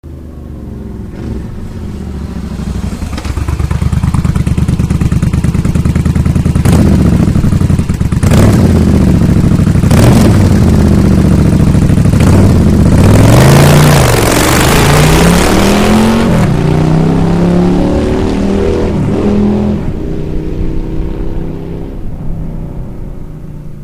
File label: suzuki 1400 idle go; ringtones